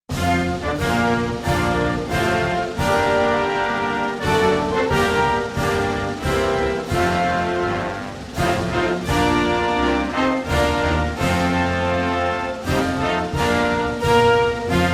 United States of America National Anthem (Instrumental); polmuz festival